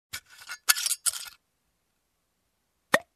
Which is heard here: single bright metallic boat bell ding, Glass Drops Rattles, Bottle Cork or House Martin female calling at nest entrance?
Bottle Cork